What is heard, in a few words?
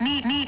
bip bip